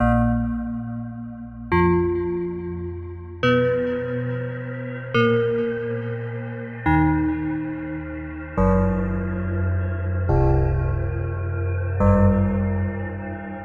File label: Strange Ambient Music Monastery Broken Chimes